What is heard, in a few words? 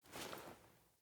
Foley Action Default Block Remove